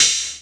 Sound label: HH, Dance Mega Drums; Best Service; HH HIP